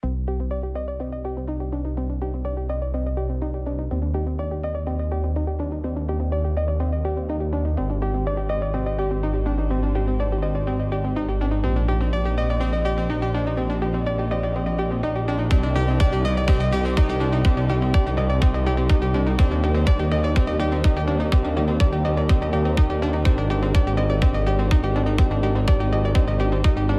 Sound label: Electronic Impulse